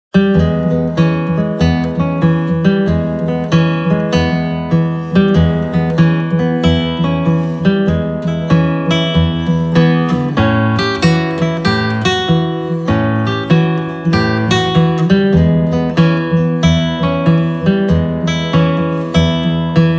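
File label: Guitar; Saitenwege nach Nordamerika Band 1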